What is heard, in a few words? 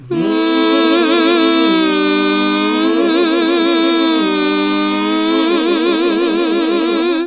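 Gospel; Best Service Gigapack 1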